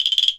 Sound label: CLKR TOY; ILIO; Synclavier Percussion 2; World & Orchestral